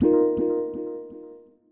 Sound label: menu accept ringtone; sonic